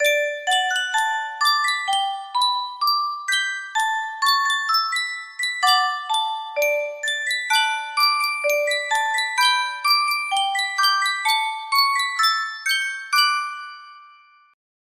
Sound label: yunsheng custom tune music box